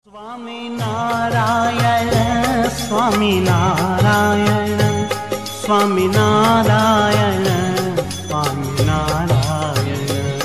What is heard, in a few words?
swaminarayan ringtone